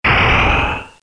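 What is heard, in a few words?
magic hit; Total Chaos Nukem 3d Pack; duke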